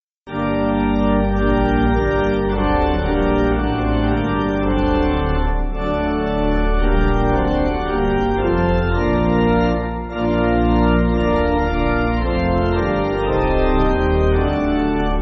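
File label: Pipe Organ, Music Backing; Culross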